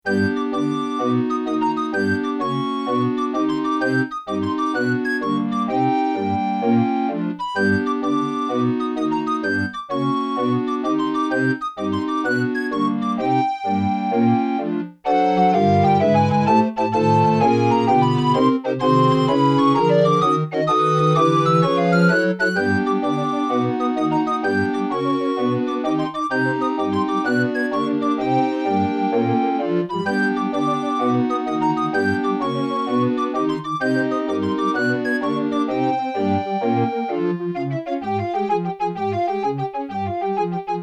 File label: Fanfare